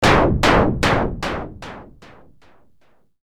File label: forge